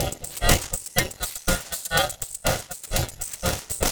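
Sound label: rhythm loops, 122 bpm; Rarefaction - Poke in The Ear With A Sharp Stick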